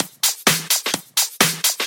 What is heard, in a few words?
House loops 128BPM; Vengeance Samples